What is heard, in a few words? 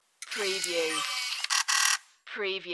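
Turn On Camera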